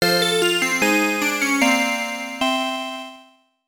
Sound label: Jingle; turbografx 16; Urusei Yatsura Stay With You